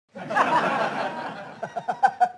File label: crowd laugh